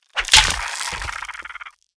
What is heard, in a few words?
mirelurk attack; npc